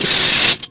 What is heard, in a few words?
Arc Spark; Incidentals; Mace Griffin Bounty Hunter; XBOX